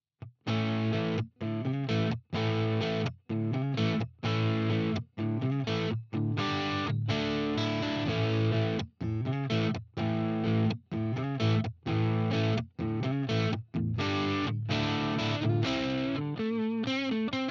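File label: Gretsch White Falcon distortion neck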